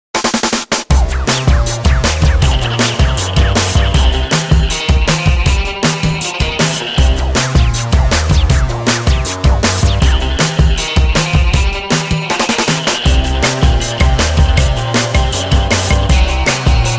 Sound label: bgm; JumpForTreasure